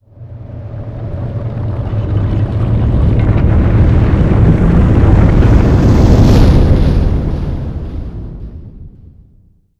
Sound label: Whoosh